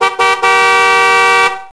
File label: car horn; Max Payne